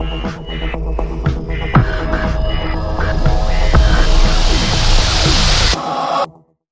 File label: Link Sting